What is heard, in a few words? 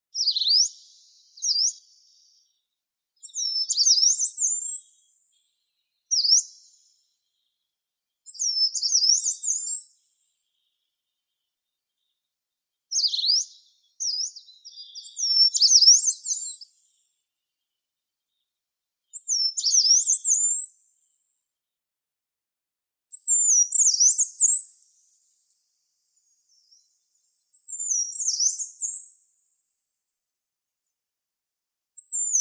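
yellow-browed warbler, Phylloscopus inornatus; penochka zarnichka; zvuki prirodi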